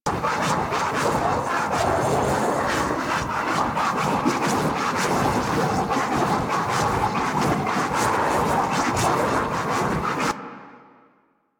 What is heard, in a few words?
Howl Loop; Warframe; Voruna